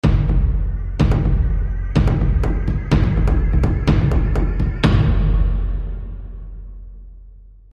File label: zoomout